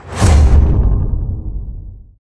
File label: Boss Cannon Show; helltaker